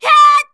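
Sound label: MON ATTACK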